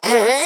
Rogue Prepare; Pecheneg

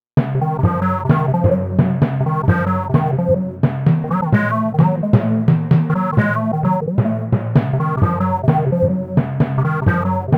music cave bpm130; Donkey Kong Country Returns HD

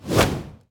Grain shot

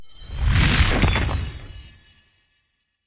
Earth Splinters

